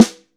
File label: TAMA; Best Service; Real Mega Drums VOL-1; WHGM KIT 4